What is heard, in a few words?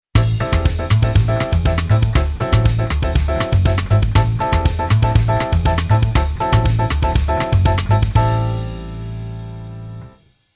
Keyboard Pf Bossa